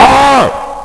Boss Death; Grunt

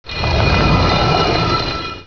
slab slide; objects; Heretic II Demo

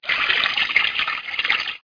slider

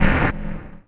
scrape

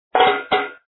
tin can; maya miguel global groovin

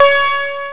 A LEAD; CDTV Lemmings

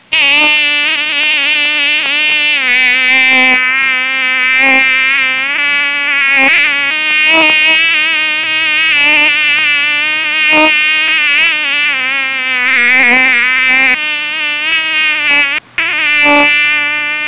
mosquito; insects

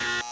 Beep (Buzzer); Warzone

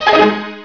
Out Hole Bonus

